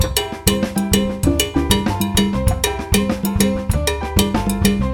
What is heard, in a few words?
bomba